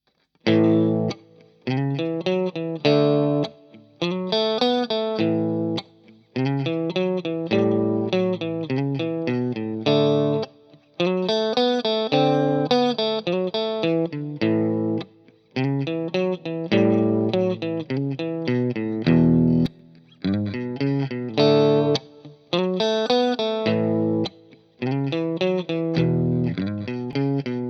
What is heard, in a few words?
12 Bar Riff, guitar